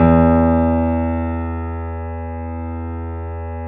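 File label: grand piano E 1; USB Soundscan; AKAI; Keyboards Acoustic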